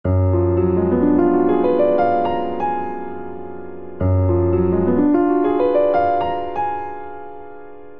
Ostinato Free Mysterious Ascending 60 bpm, Grand Piano; MIDI Clips; Ableton